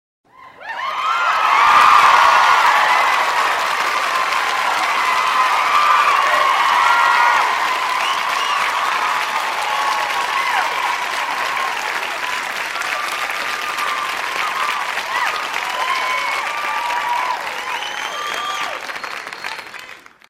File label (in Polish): Efekt dzwiekowy - Aplauz tlumu oklaski